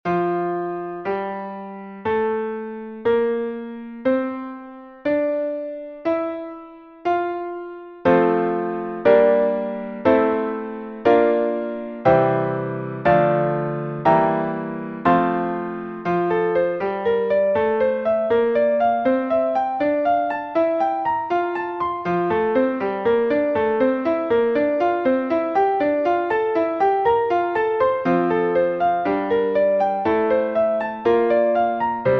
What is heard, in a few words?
Chord Shapes, Basic Scales And Triads, F